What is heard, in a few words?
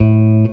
Guitar & Bass; Quantum Leap